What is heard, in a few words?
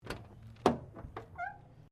CAR MAZDA RX7 SERIES3 EXTERIOR DOOR OPEN